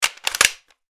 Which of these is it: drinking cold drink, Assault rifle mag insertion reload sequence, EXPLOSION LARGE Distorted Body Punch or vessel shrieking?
Assault rifle mag insertion reload sequence